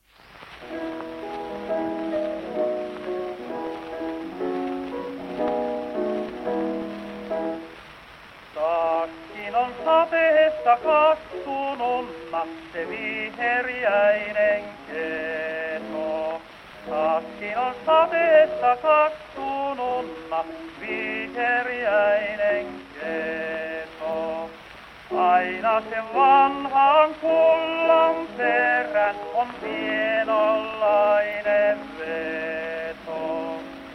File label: Gramophone